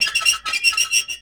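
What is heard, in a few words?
METAL SQ., metallic percussion; PERCMETALIC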